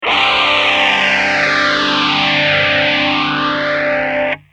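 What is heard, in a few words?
guitar acetone lh100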